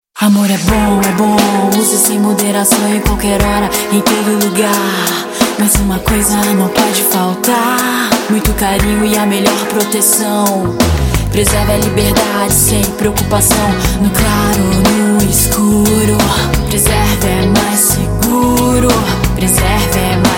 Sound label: jingle